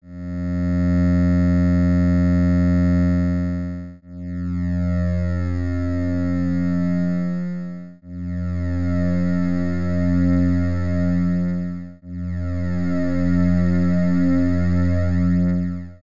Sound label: flanger